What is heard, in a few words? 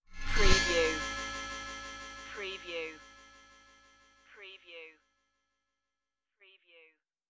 shiny magic transformation